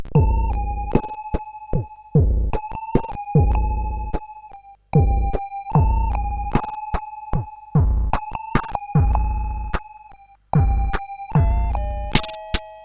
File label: Ambient, CSG8580R5